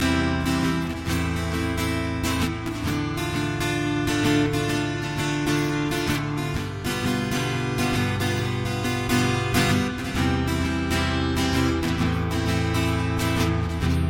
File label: Waiting Acoustic